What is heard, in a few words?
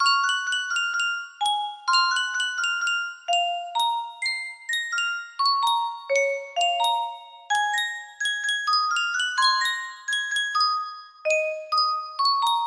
Yunsheng custom tune music box, music box melody